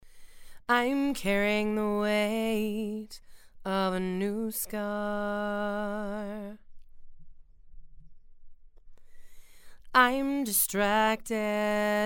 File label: Female Vocals